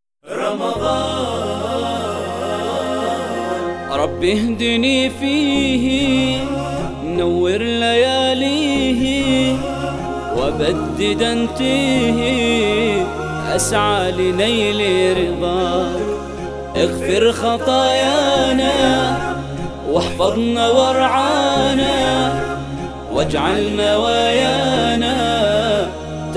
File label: Vocals Only, nasheed